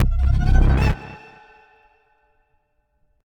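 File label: Shock End; raw game audio files; MiSide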